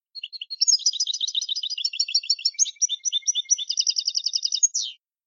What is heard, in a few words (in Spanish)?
mecida, campero